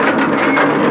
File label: citizen bridge wheel loop; hl2 ep1; ambient, levels, city